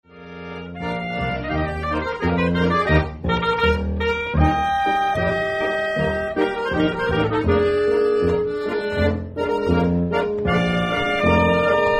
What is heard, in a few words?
polka